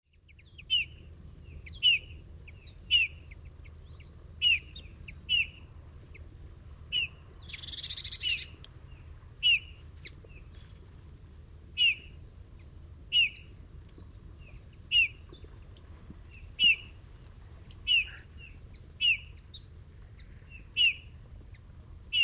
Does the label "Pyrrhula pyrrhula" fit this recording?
Yes